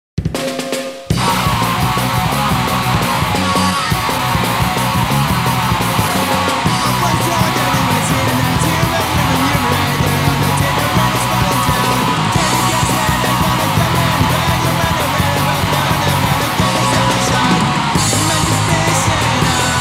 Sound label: Dramatic Country